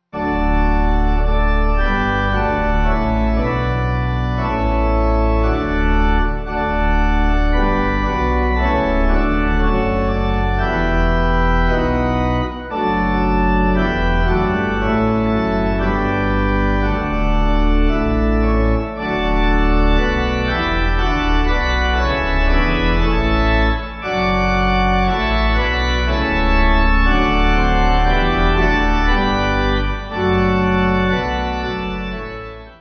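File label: The Saints Of God, Pipe Organ; Jervaulx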